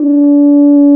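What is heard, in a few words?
brass, FR HOR, F.Horns; French Horn; F.Horn 1&2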